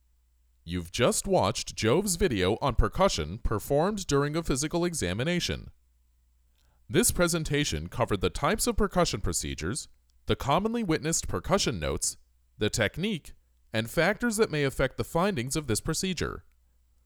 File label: Percussion